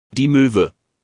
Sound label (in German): Moewe; wildvogel